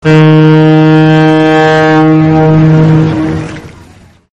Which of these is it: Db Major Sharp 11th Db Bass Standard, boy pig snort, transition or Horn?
Horn